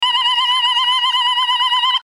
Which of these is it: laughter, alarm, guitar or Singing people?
alarm